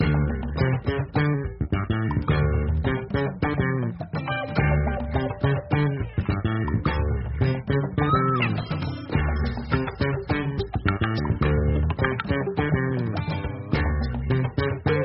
comical scenario

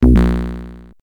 death sound effect; sonic

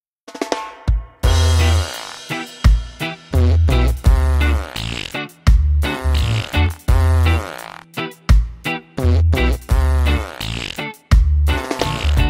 farts; mr reggae

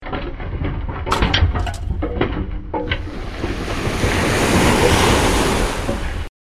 ship crash landing

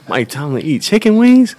eats chicken wings; mike tomlin

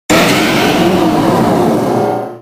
mega metagross cry

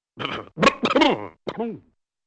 clears throat; general

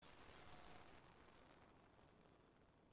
bell; bell of armor

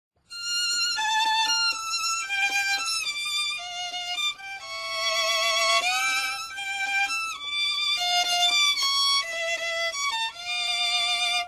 sad violin

